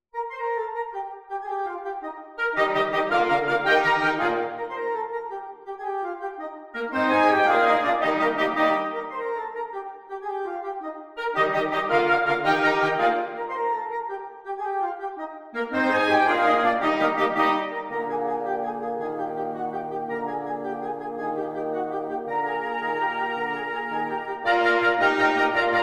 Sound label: Octet; Pleyel Octet